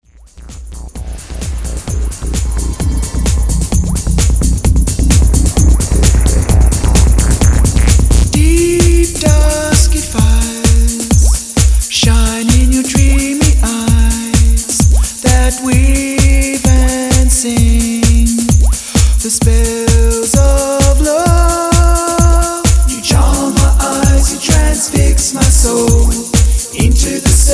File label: Drum